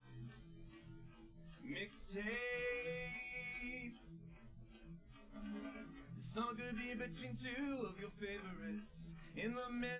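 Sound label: with drums live rec 5cm sim; SASSEC07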